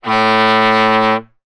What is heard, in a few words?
transportation boat horn dry; sound effects one